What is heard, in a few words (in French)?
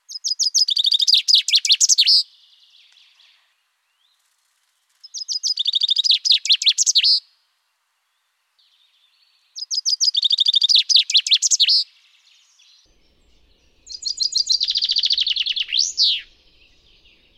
Pinson des arbres